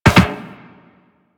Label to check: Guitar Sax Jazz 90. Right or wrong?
Wrong